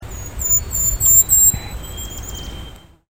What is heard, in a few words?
Golden-crowned Kinglet